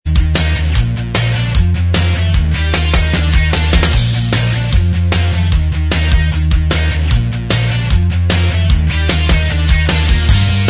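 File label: tv theme instrumental track, backing tracks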